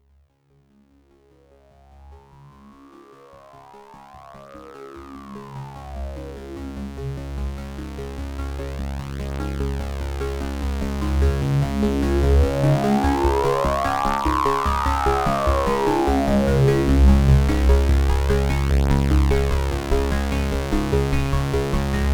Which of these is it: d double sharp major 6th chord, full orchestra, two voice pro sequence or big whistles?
two voice pro sequence